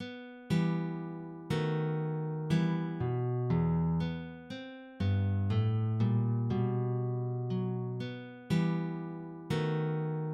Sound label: larghetto, guitar; Ferdinando Carulli